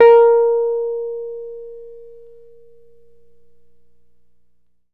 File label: E PNO A#3 MF; Synclavier Sampler Library; KEYS AND GUITARS